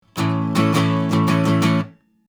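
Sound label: sE RNR1 Acoustic Guitar 50cm Strum Hard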